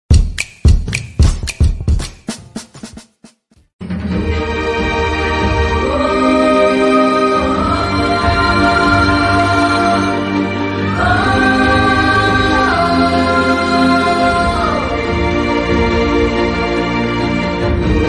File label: sad love bgm; ashoka vanam lo arjuna kalyanam